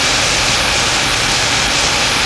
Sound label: sapper timer